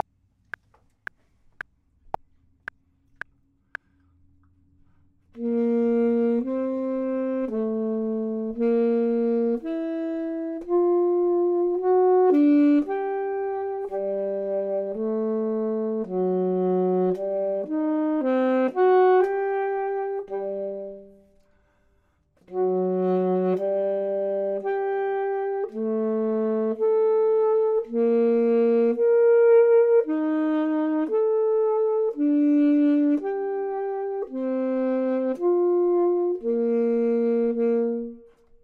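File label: bottom, Saxophone and Clarinet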